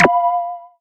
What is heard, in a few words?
Roulette lose